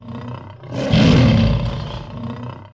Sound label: ROAR; Only the Brave Can Rescue the Kidnapped Princess